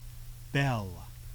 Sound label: bell